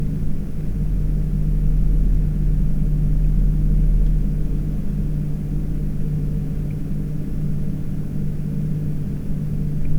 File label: 1000 RPM